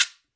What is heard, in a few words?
GUIRO, DANZON; InVision Interactive; Percussion Slam